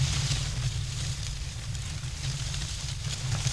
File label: palm tree; de jor1